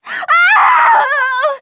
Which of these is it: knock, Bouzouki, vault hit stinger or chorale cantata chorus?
knock